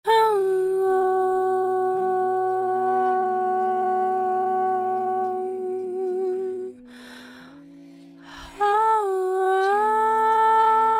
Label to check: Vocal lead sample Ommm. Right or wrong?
Right